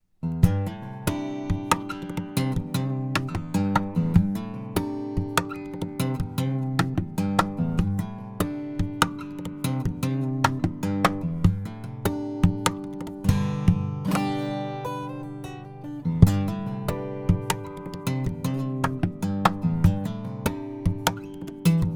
gitarre perkussiv dry; chandler limited rs124

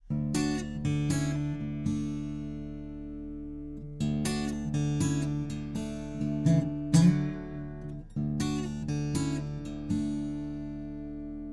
Fame Pro Series SD-100 test kugel picking 12 bund l schallloch r

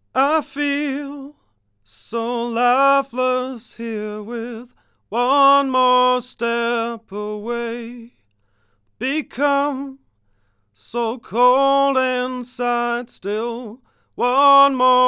VT-12 Gesang; fame pro series

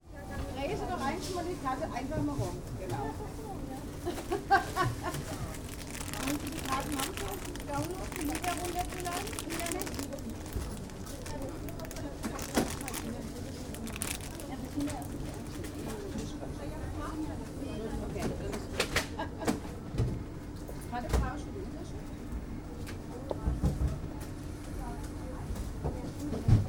supermarkt

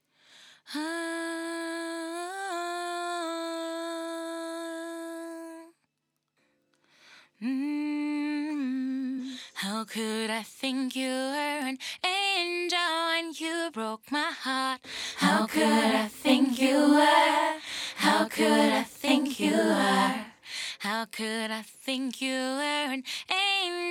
slate digital kompressoren konsolen vocal group bus compression; fg-grey

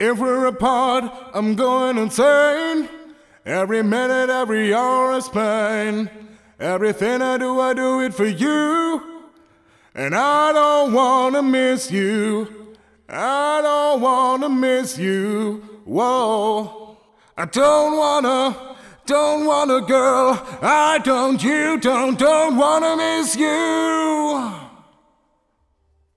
effekte vocals long realverb pro nice vocal wet

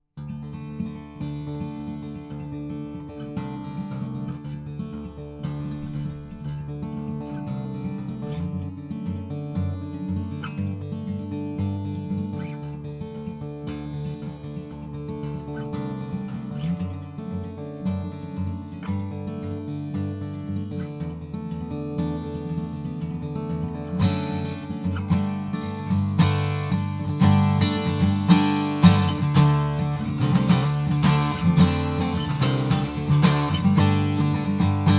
tascam tm-180 gitarre

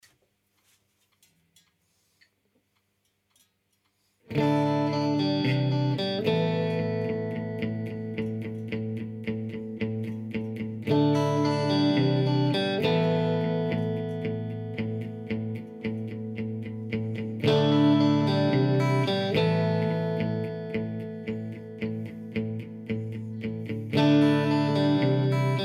eventide tverb gitarre insert

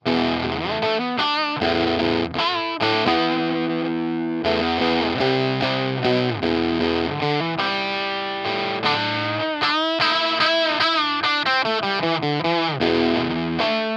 fuzz dry blend auf 12 uhr

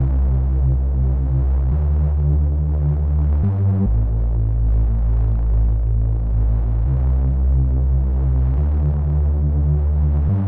mit effekten

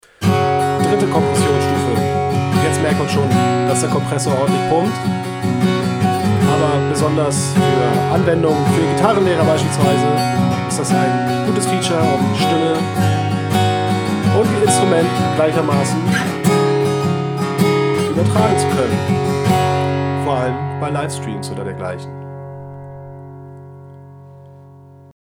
instr stimme kompr; apogee hypemic

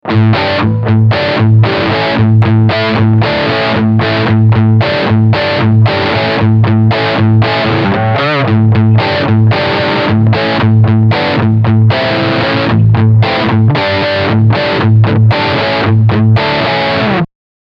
esp ltd ec-401 crunch beide pickups

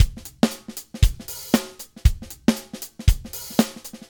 huellkurve sound envelope follower dry; Die Hüllkurve erklärt

